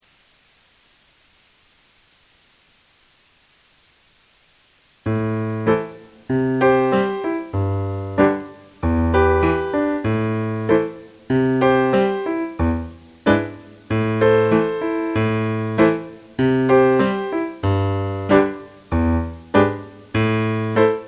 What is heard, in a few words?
piano with bass lines Medium; Muilean Dubh